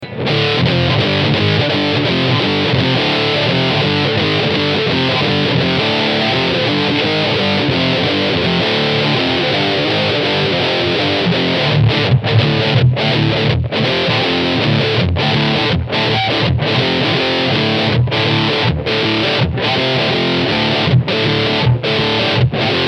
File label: irascible